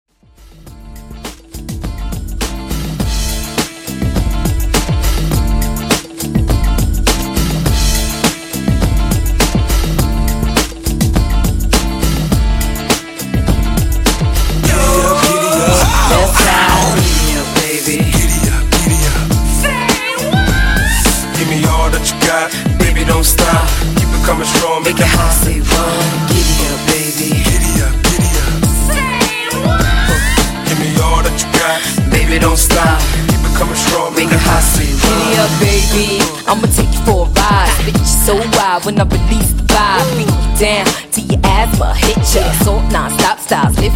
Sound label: 90s Hip Hop Bass, 103 Bpm